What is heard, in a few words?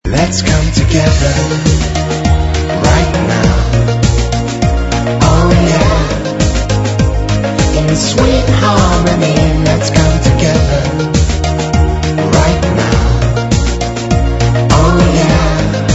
sweet harmony